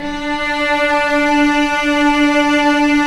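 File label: strings, mf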